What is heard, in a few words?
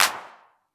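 clap, perc synth; Wizoo - Platinum 24 Electronic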